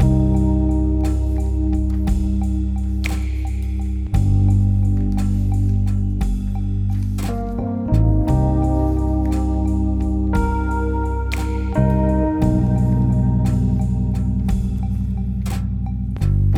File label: Rainy Noir, Loops; BB 2HTC Samples Vol 4